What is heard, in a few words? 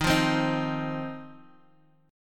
C Minor Double Flat 5th D sharp